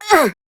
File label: Pain, Grineer Queens Guards; Battle Barks; Warframe